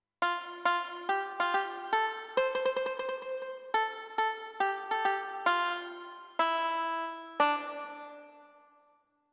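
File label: Banjo, digital piano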